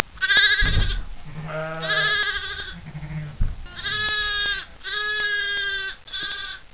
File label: baa lamb